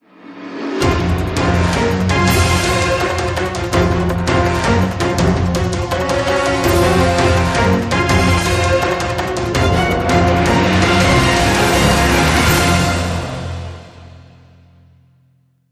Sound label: Less Percussion